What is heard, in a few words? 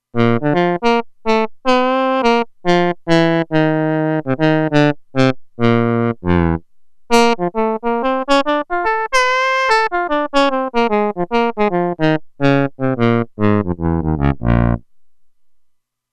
Sax, Roland Alpha Juno-2; Original Sound Chart patches